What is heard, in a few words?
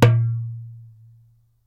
darbuka note